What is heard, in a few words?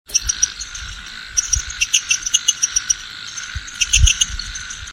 Dendropsophus gaucheri